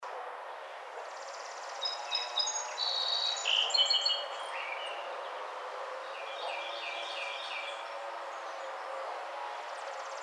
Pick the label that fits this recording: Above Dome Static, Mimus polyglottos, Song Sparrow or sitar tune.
Song Sparrow